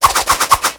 brandish; Fly For Insanity

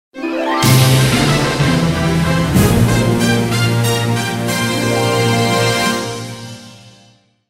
Bgm In Level Up; Fly For Insanity

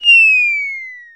whistle; FlyForInsanity

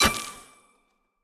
match sequence sfx; Candy Crush Friends

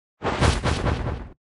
Harpy Idle; FlyForInsanity

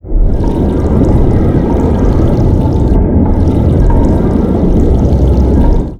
Pipe; FlyForInsanity

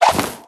chocolate box close; Candy Crush Saga; bingo

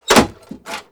Item Open box; Fly For Insanity

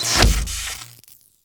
Silent shot; Pc Skill; FlyForInsanity

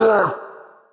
spirit hurt; FlyForInsanity

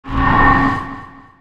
skill bow range increase; FlyForInsanity

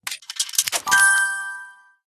padlock open; Candy Crush Saga; royal pass

